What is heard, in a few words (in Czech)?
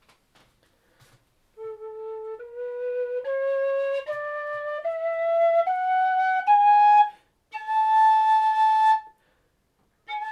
Pastýřská píšťala A dur